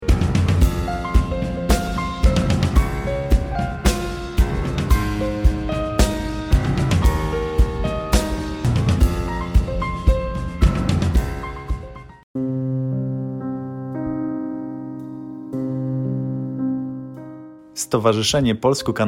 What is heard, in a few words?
Jazz; YYC